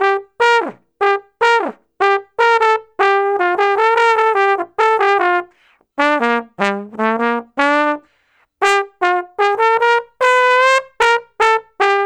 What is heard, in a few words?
Trombone Solo; Sonic Foundry (Sony Creative Software); Jazz Solos and Sections